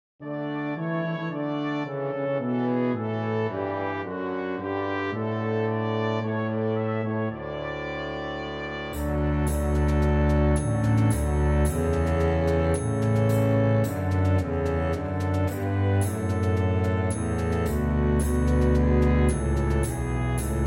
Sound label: O Come All Ye Faithful DB track with Melody